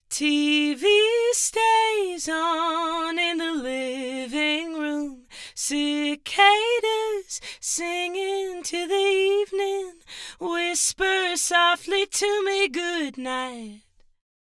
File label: vocal highend boost dual mono 10ms delay on right tilt filters on sides, tilt shelving filters, boosting above 1500 Hz with slightly different gain settings on the left and right; Haas effect; FabFilter Pro-Q 3